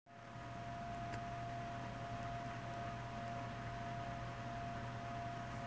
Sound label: ML240R cover